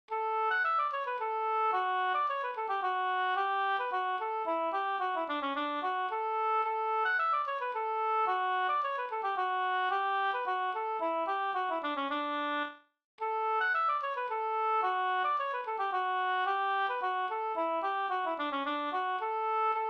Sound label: polska